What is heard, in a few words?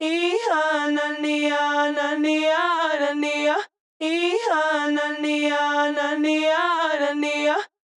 120BPM G CHANT DRY